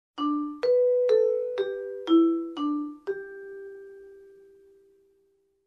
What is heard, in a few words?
Logo melody played on vibraphone